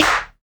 CLAPS HIP, CLP

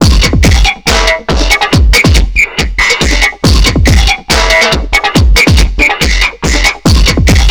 TRIPHOP; Funky Xtreams; INIS

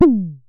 Synare Filter; Star Instruments Synare 3

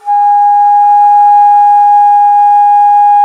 T. BONSURI, India Winds; E-MU Producer Series Vol. 7 – Old World Instruments

